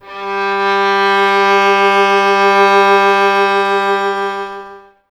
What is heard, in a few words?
violin, Solo Instruments